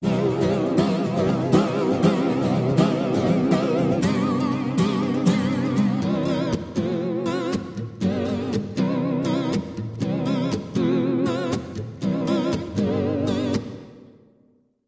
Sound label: Vibrato reverb